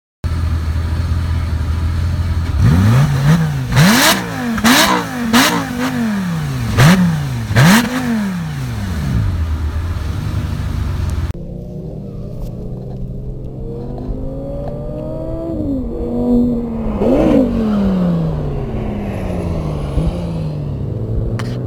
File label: Lamborghini LP560 4 Spyder